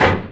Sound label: Mega Mine Impact; Worms3D